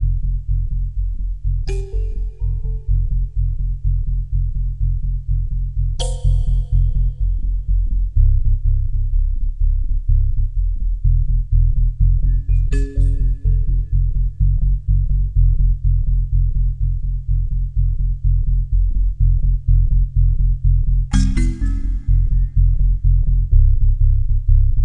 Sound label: jungle tension; alien earth